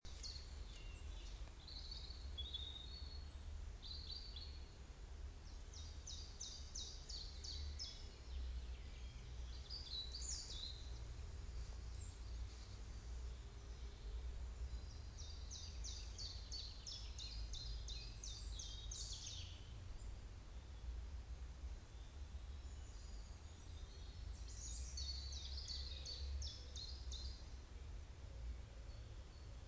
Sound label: highgate woods